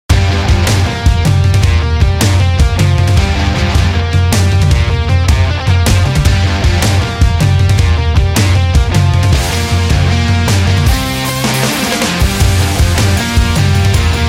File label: Indie Rock